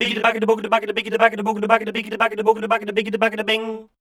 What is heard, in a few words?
scat, voice